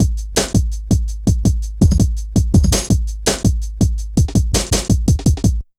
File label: Ezee Rolla, Drum Loops